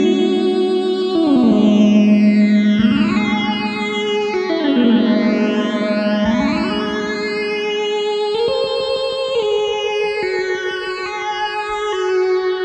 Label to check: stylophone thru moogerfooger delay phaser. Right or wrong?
Right